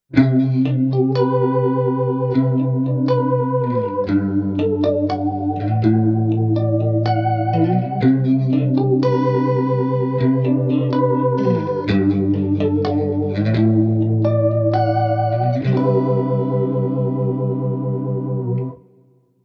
bias vibrato ambient

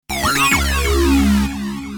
Fat Sync

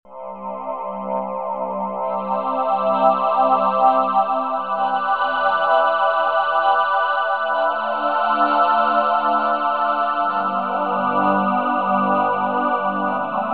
PPG Choir

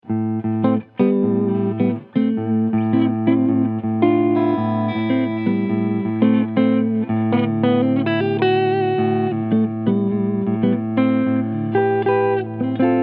TE 53KR BL Tribute Serie Front PU Cleansound; Harley Benton

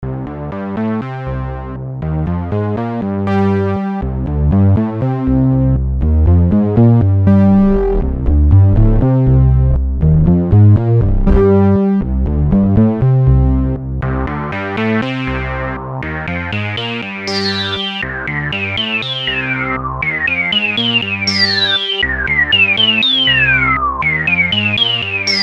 feedback

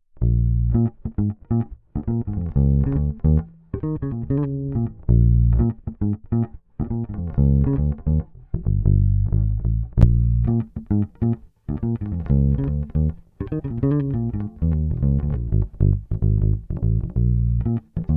Bypass Compressor100; Walrus Audio Badwater

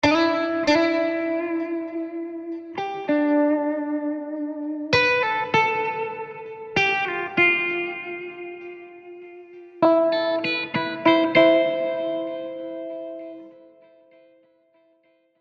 Guitars Melodic Pulses and Phrases; Scoring Guitars

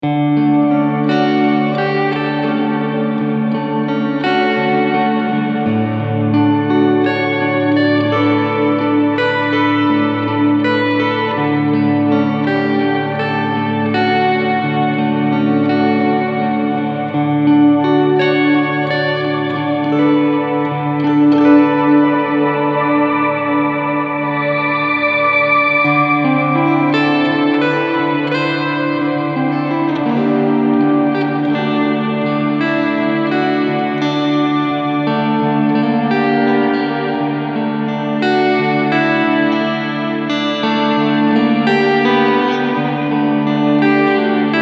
AMP Shimmer

